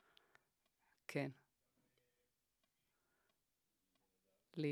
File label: Vocal